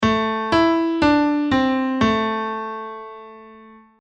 Minor Key; Quiz

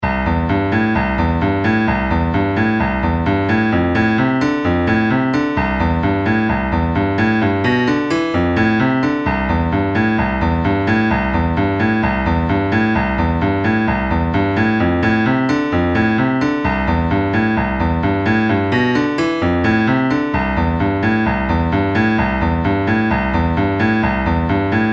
Blues Free Play Fast; blues scale bonuses

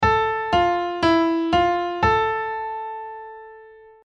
Minor Going Down; minor key going down piano quiz

